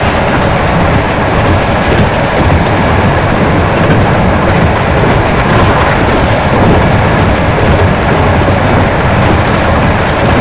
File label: run, Train; OpenBVE; 55022 Royal Scots Grey